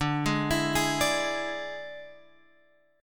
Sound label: D Suspended 2nd Flat 5th D, Guitar, Open G